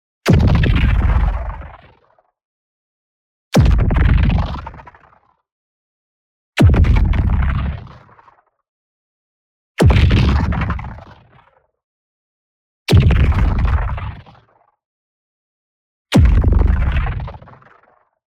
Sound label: EXPLOSION LARGE Deep Punch; boom library; Matter